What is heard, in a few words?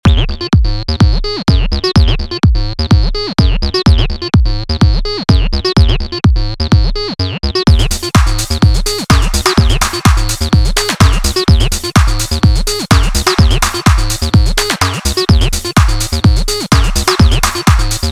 fast acid; 100 acid vol 2